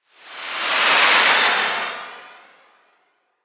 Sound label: Whoosh, Small, Rocket, Pass, By; 5.1